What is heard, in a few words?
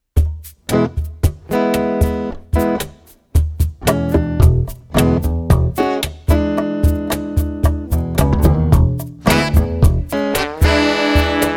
ampex 350 funk; wet